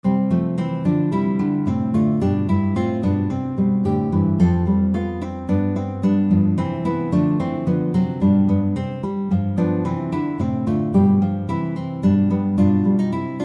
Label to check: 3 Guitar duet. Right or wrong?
Right